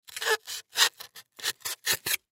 Styrofoam Cut Knife, Styrofoam Cut Knife Squeak; Squeaks; Objects; Blastwave; Foley

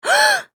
Human Female Gasp; Gasping; Voice

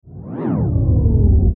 Ascend Descend Grind Swell; Blastwave

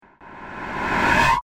Psycho Horror Stinger; Scary Tone Sound Effects

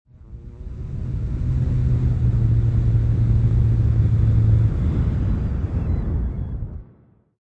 UFO, Spaceship engine rumbles then shuts down; Spacecrafts

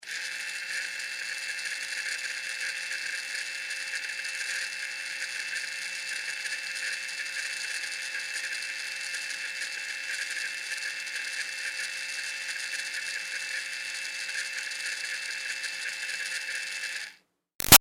Toy Fairy Wand Med Far Run Long; Miscellaneous Toy; Sound Effects:Toys; Big Room Sound

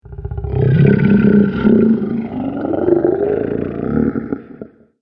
Massive Dragon, monster

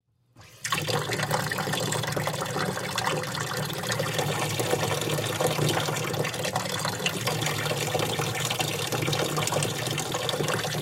Utility Sink On; Blastwave

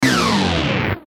Descend Buzz Distortion; Descending Sound Effects; Blastwave

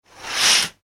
household curtains pulled; Curtains Being Pulled / Drawn; Living Room; McKinney Sound

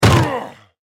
Sports Football Tackle Hard Grunt

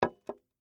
Whiskey Glass Down

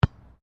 SOCCER BALL BOUNCE ASPHALT; Soccer ball sounds; Sports

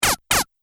Double Laser Shot; Double Laser Shot 03; Laser Gun Sound Effects; Sci-Fi Laser Sound Effect; Andrew Fleming

